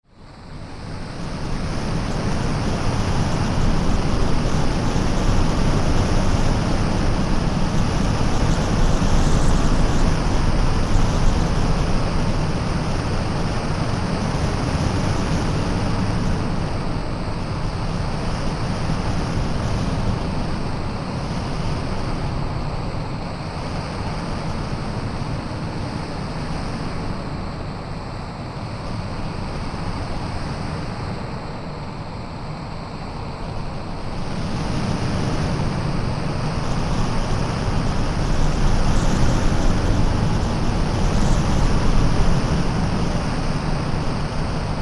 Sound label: synthoid wind